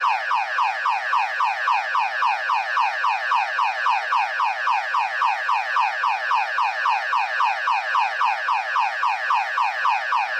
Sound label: Car Alarm; car alarms